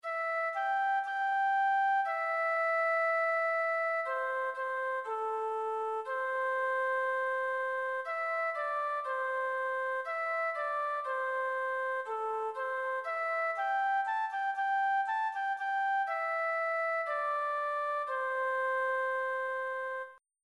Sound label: midi